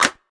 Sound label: clap single hit d sharp key, Royality free clap tuned to the D# note. Loudest frequency: 2878Hz; single hits one shots